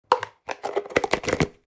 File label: Plastic food container close